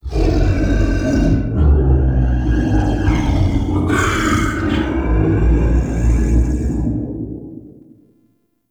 Root Cave Root Chatter D; Ambience; One Offs; Neathuns Root Cave

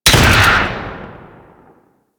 Rocket Launcher Fire; Enemies; Warframe; Kela De Thaym

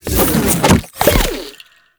Reroot Cast; Warframe; Nokko

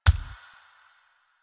Steps; Warframe; Kalymos

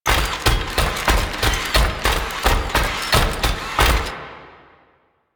Melee Walk Loop; Enemies; Warframe; Necramech

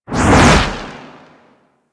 Dodge Roll Right Low; Movement; Warframe; Archwing

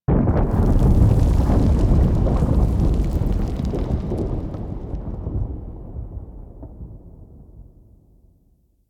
Earthquake Event; Ambience; Warframe; Orokin Moon

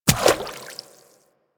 Infested Medium Run Water, footsteps; Warframe

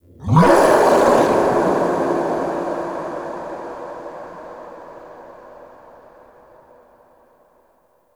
Shrill Screams; Warframe; Man In The Wall

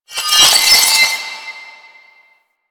Citrine Empowered Geode Loop Start C; Warframe

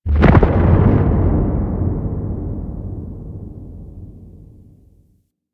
Moon Earth Crack Large, Ambience; Warframe; Orokin

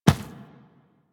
Infested Medium Run Default, Footsteps; Warframe

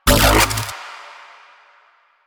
Updated Shot, Weapons; Warframe; Moa Pet; Tazicor

